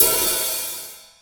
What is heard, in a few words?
hat half, Rod Kit; Akai MPC500